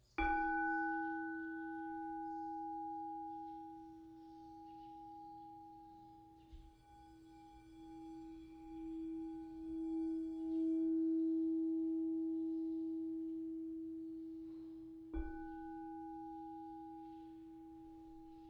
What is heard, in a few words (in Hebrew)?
קערת קריסטל b 432, 432hz שרה קערת צ'אקרה קוורץ; 8 אינץ